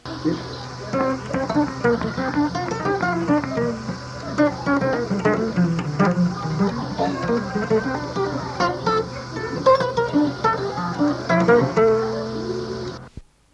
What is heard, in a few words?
Single line guitar improvisation